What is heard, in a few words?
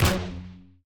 hit wave light; CrossCode; battle